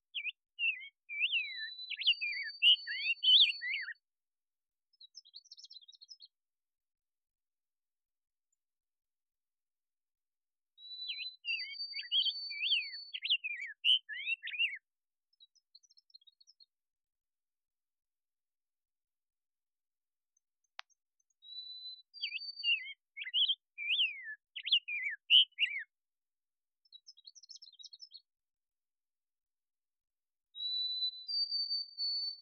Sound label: Rose-breasted Grosbeak